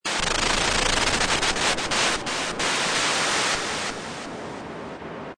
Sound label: noise